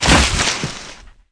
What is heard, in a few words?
Footsteps; unreal development kit